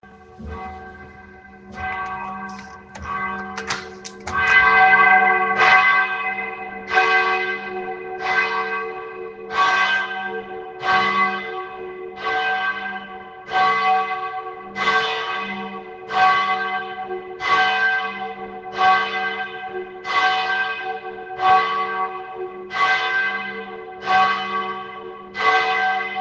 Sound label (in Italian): campana; Il suono delle campane che ieri sera, venerdì 16 gennaio 2026, per la prima volta alle 20; Modena; Modena, la campana contro l'aborto grazie a don Giorgio Bellei